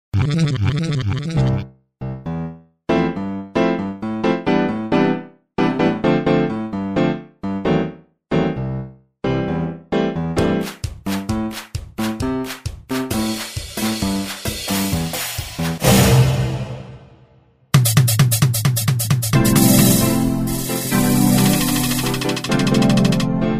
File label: June Funk